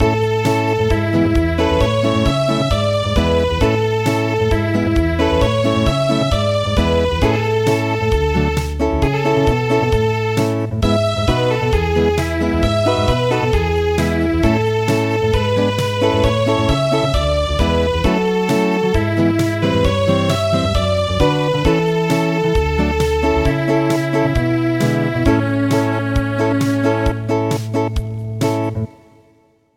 Acoustic

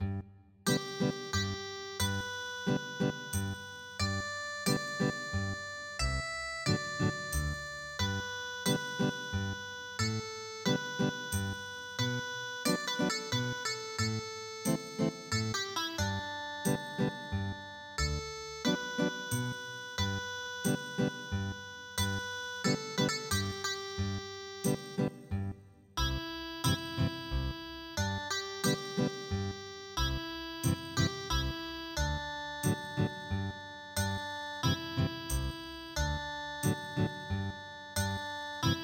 seido arirang Ethnic Guitar

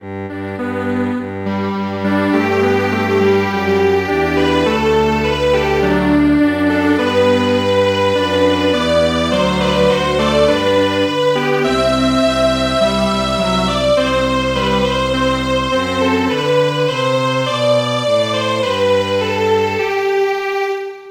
Strings Quartet, traditional

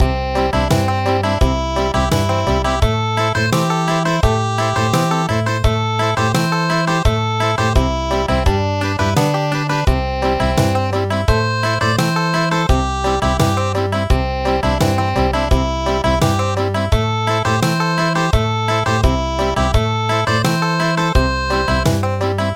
Country

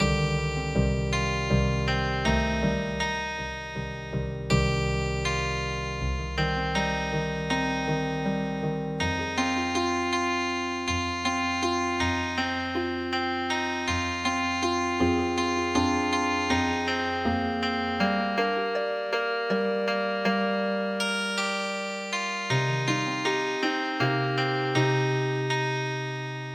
gae ta ryeong Healing Harp

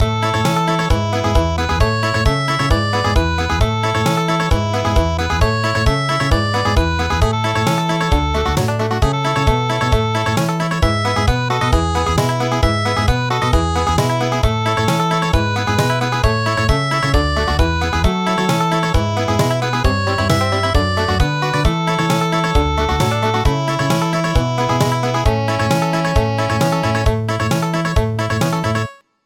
Country

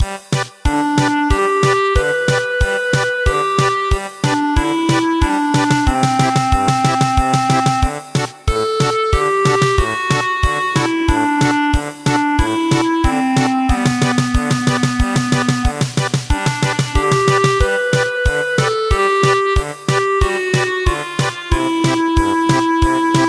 German Polka, traditional; Santa Clara

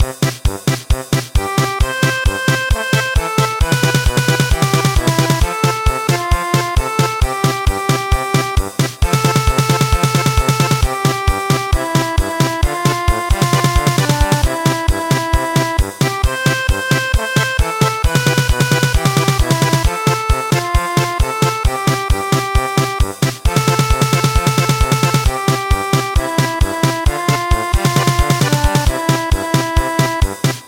German Polka; Ayelevi

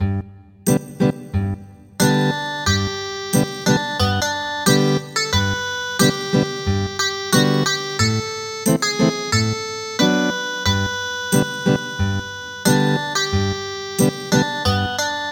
Ethnic Guitar

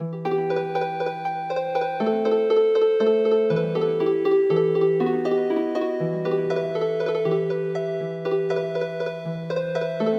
Tall Corn Healing Harp

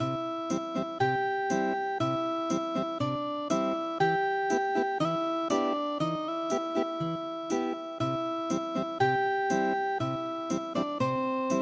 Ethnic Guitar; TaiHuChuan

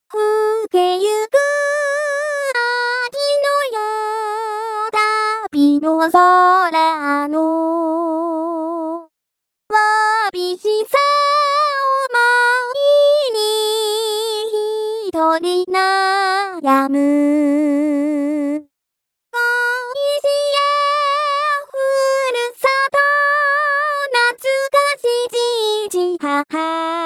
female

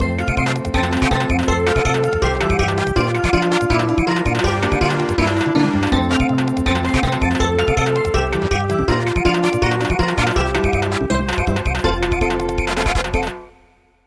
Samba

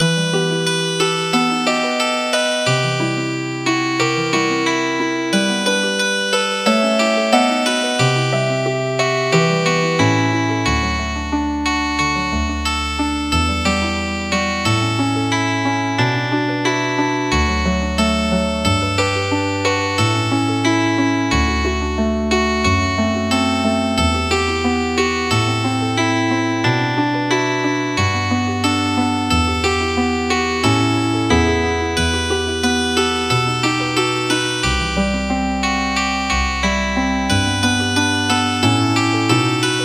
Healin Harp

